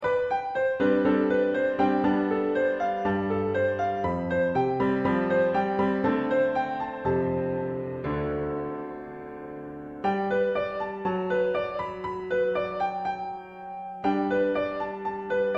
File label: Piano